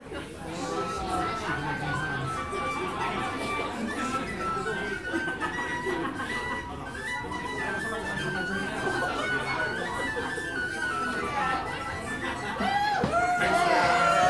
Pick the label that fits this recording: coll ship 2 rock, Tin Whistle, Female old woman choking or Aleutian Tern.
Tin Whistle